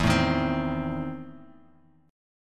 E Major 7th Suspended 4th Sharp 5th, Bass Standard; EM7sus4#5 strummed